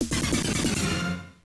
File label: Game Over (Normal); Raiden Fighters; arcade